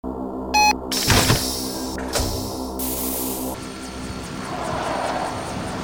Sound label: Elevator; Infiltrating The Airship